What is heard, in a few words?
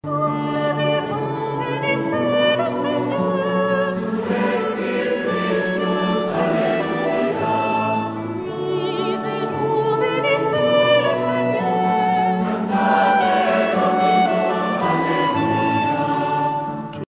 surrexit christus solista